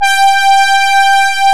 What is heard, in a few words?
MOOGS G5; E-MU Formula 4000 Series Vol. 3; Analog Odyssey; EmuClassicSynths; DOS MOOGS